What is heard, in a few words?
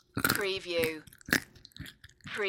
Nut Crunch Chew